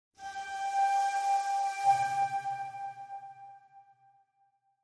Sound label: Flute Tone Increa, flute